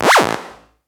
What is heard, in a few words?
Synth Shots; Vengeance Samples